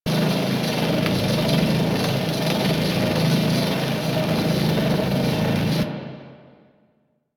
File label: Laser Loop; Warframe; minigames; Caliber Chicks minigame; boss